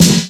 good steel snare drum sound f key, snare drum tuned to the F note; snare-drum-samples